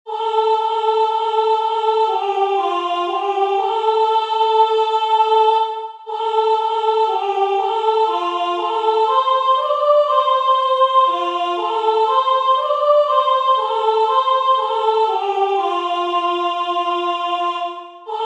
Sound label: gregorian chant